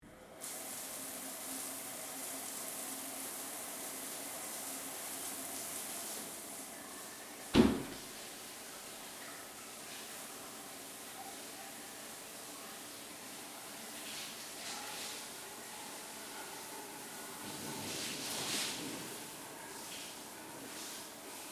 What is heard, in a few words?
Water Shower Run, On, Off, Person, Showering, Distant, Perspective, Large, Tile; Bathroom Sound Effects; BlastWave